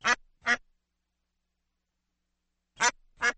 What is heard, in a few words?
country; shockwave; fixitupkatesadventure